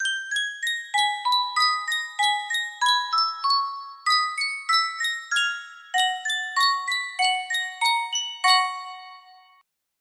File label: Sankyo Miniature Music Box, music box melody